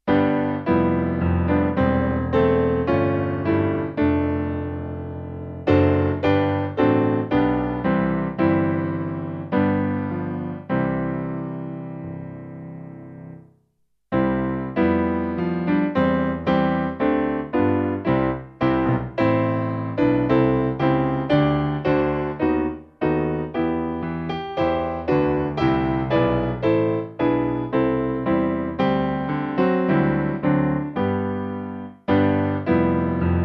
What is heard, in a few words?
Piano